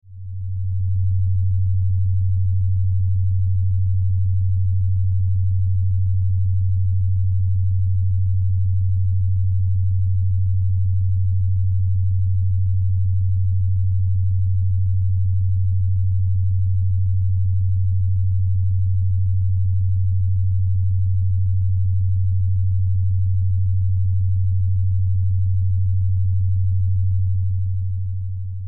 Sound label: Science Fiction Low Buzz Pulses; Deep Tones; Sound Design